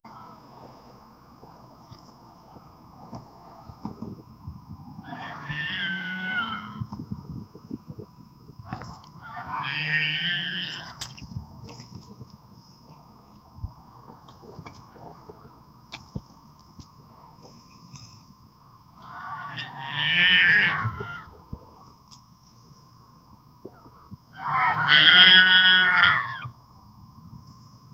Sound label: cow sound; animal call